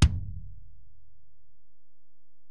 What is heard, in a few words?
Ac BD, Alesis DM-PRO; 300 Drum Machines